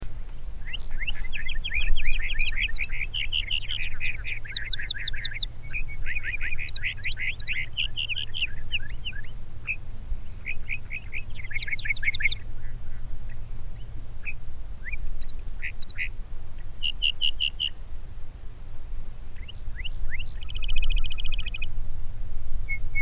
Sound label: Lark Bunting Calamospiza melanocorys